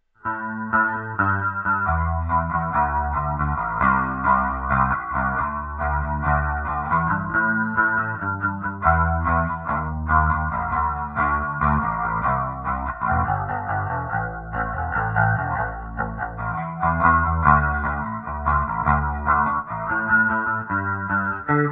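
U.K. ROCK, Zoom 506 II bass effects factory preset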